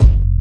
smooth kick drum sample F sharp key

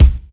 natural bass drum C key

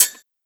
shiny hi hat closed sound g sharp

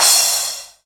drum crash f sharp key

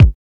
00s solid kick drum sample a key; kick drum samples

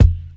high quality perfect kickdrum sample F key; tuned drums f key

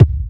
natural kick single shot c sharp key, kick drum; kick drum samples

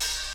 classic raw hip hop open high hat a key

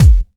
clear kick sound f sharp key; kick drum; kick drum samples

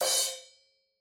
subtle open hat one shot c key

